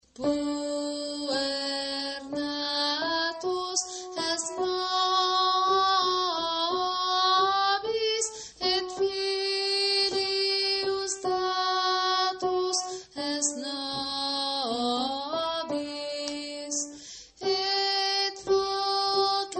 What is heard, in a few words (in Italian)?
puer natus contralti